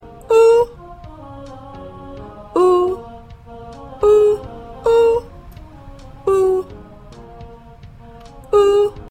Ensemble Soprano

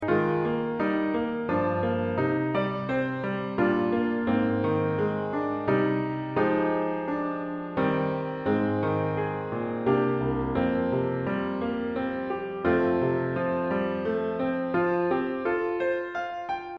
Piano

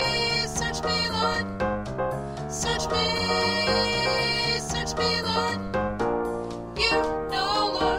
Alto

